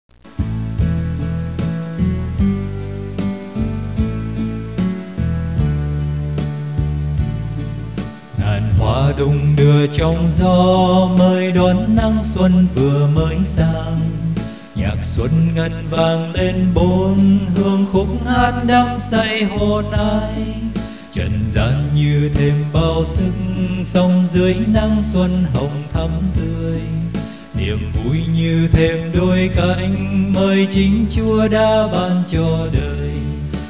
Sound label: bass